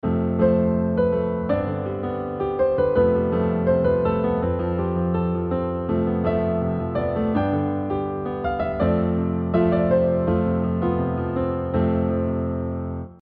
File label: modal - repetitive prog